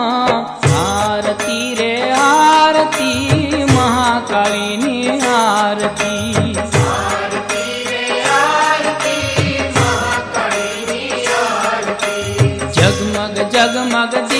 Mahakali Ni Aarti

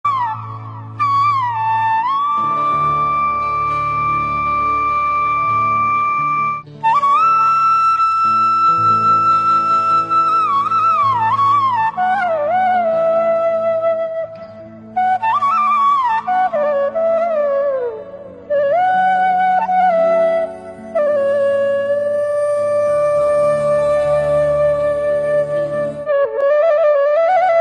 flute; krishna

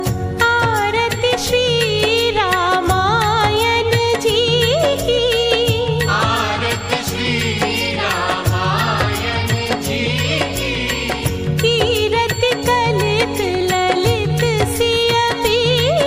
Ramayan Aarti

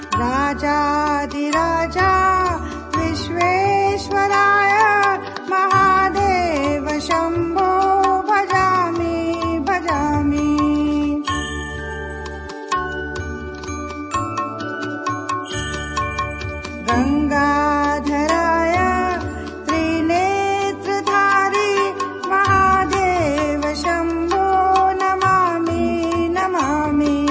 Mantra Bhajan

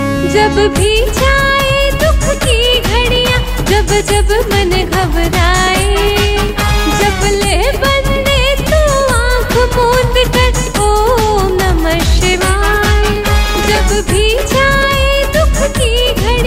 om namah shivay

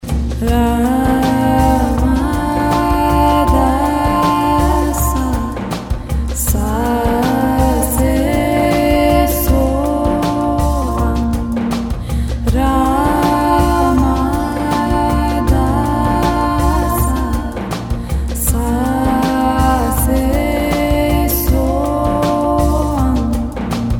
Ra Ma Da Sa, Sikh Ringtone

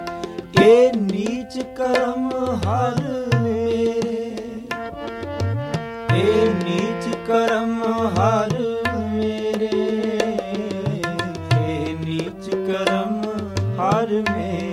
Sikh Ringtone

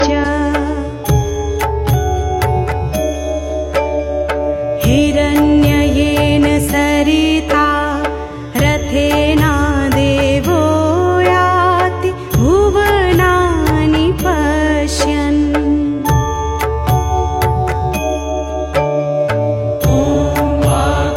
Vedic Surya Mantra

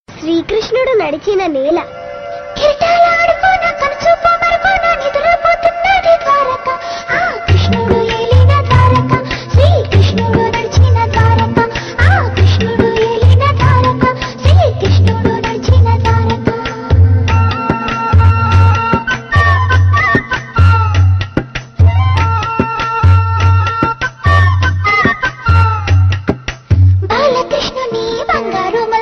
krishna devotional, ringtone

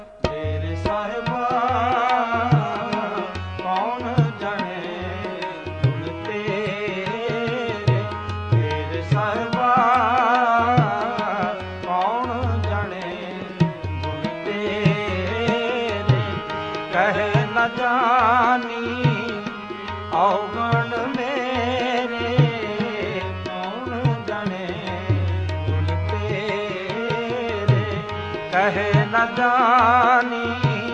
Sikh Ringtone

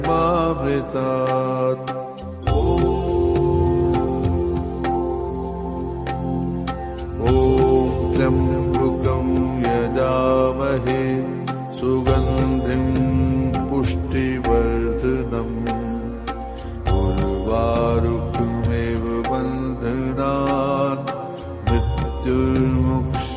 Maha Mrityunjaya Mantra 108 Times

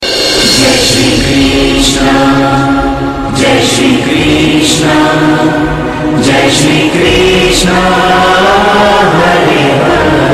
krishna tone, ringtone

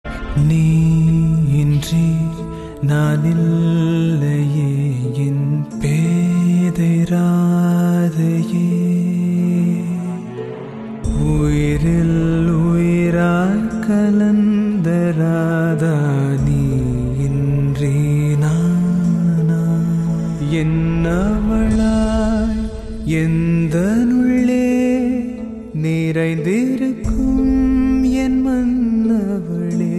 sad song; krishna ringtone

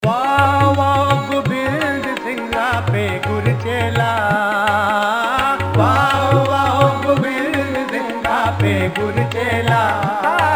Sikh Ringtone

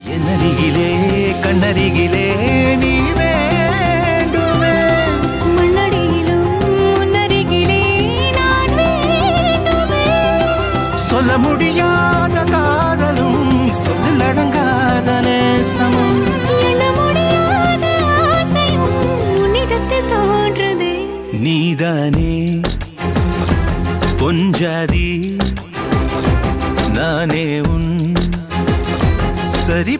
male; shiva ringtone

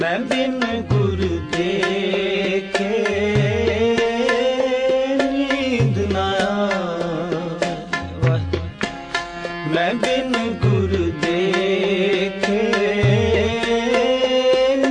Sikh Ringtone

